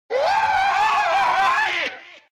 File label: fake scream; peppino